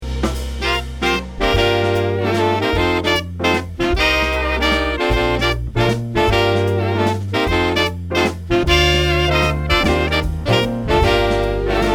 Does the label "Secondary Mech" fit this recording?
No